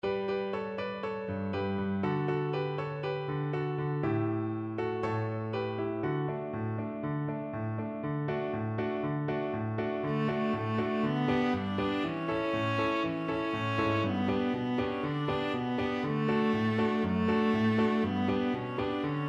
viola

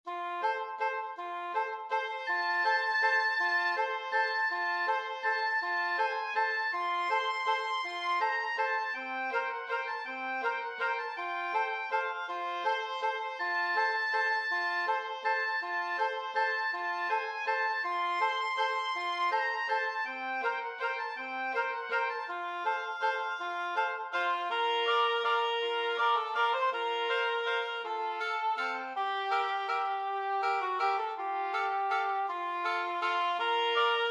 woodwind trio; verdi traviatta

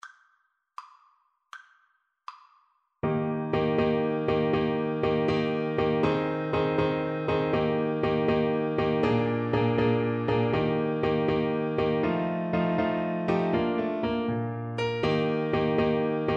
over the river, Tuba

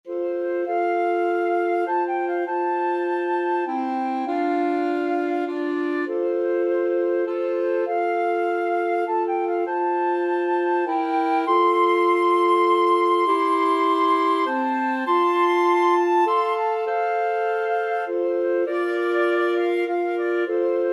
amazing grace, flexible mixed ensemble 3 players

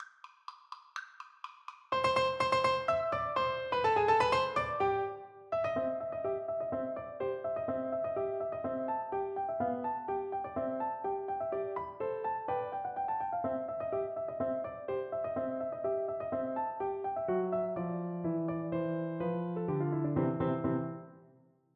Piano Duet

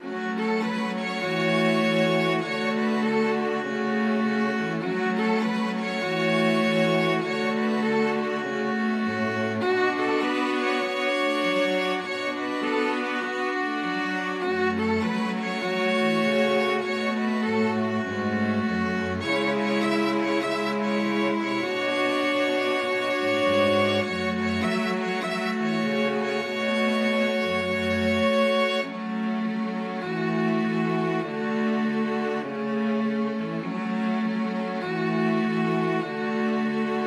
STR quart, string quartet

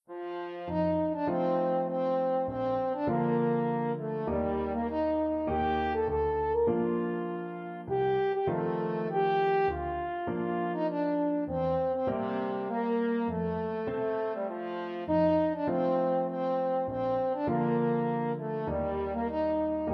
french horn

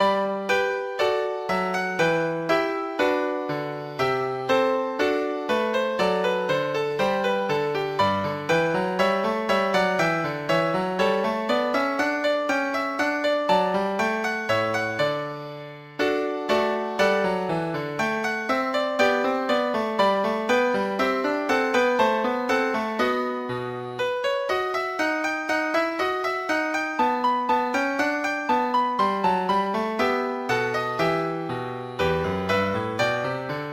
gavotte, piano; french suite 5

